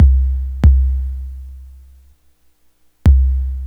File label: GRV, Guit It On; E-MU Formula 4000 Series Vol. 1; Hip Hop Nation; Guit It On 78.5